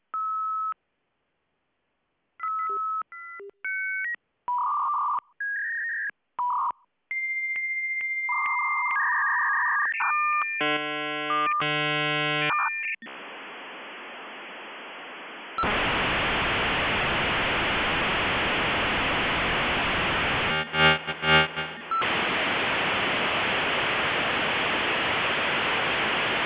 Acer modem Surf56 laserbeam

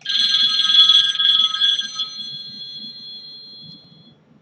Pop Ring

